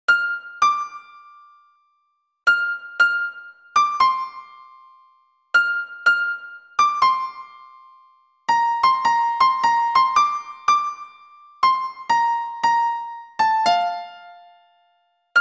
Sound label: love bgm piano notes; arjun reddy